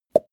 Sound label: plop; soundpool